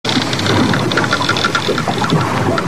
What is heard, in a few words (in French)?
Voilier